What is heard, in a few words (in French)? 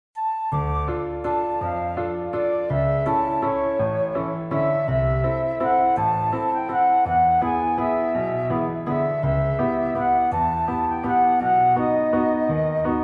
Valse pour Flute